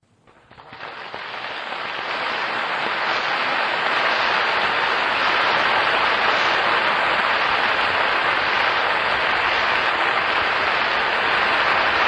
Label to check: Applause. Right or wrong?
Right